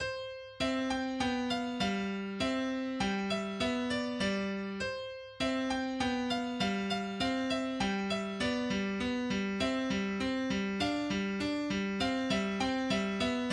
Arietta in C Major

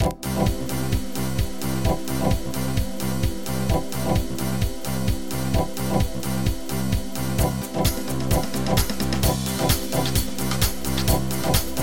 TechnoParty

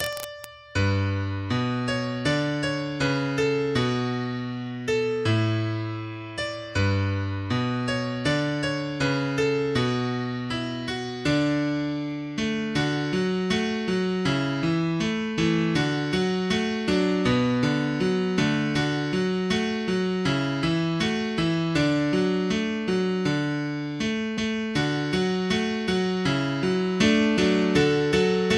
camp song piano solo

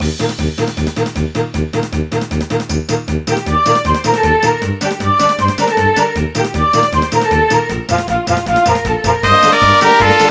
Energetic Version

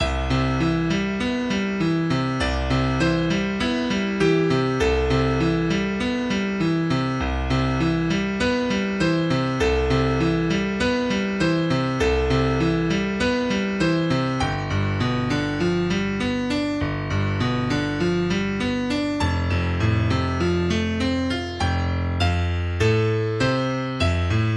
Strange Music

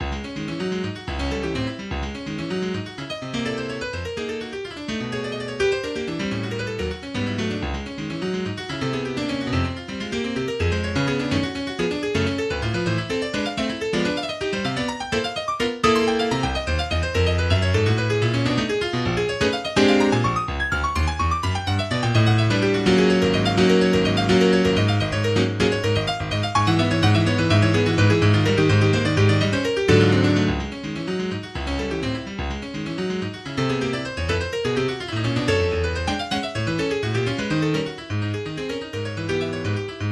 Prelude No 4 in C sharp minor

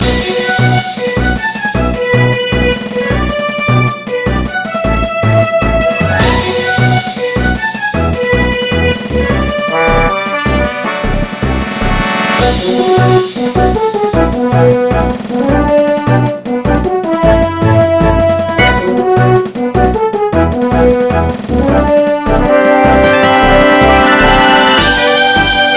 Menu Screen

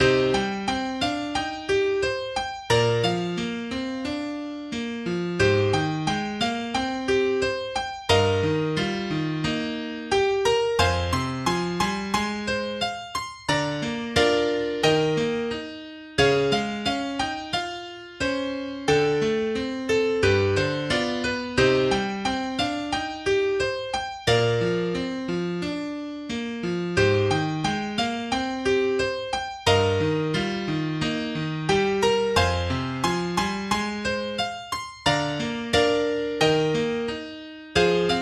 Opening Theme Piano

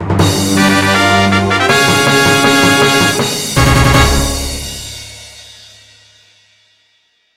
Fanfare

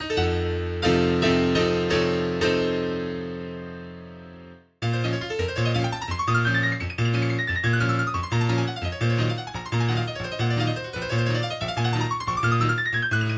Prelude in B Flat Minor

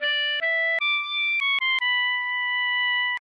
Recorder

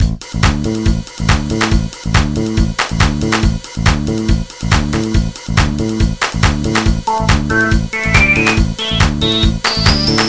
Techno Waves

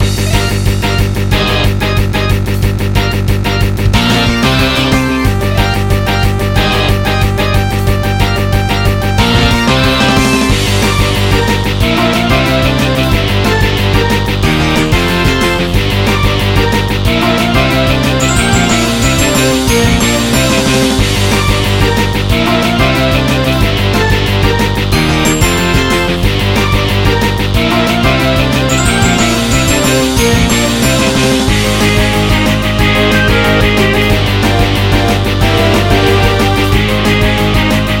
Enemy Approaching

